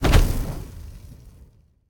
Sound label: cast fire; smite; god merlin